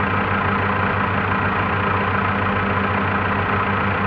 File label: generator; ambient